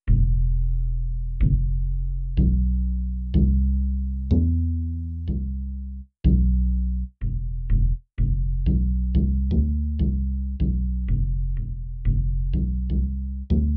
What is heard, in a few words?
bass